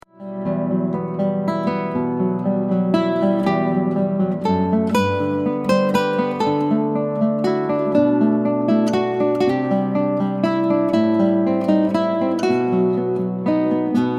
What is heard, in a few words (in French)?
classique, guitare